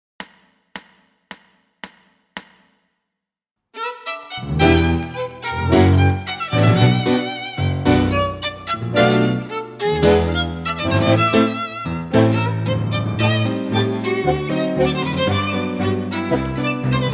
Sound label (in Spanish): con violin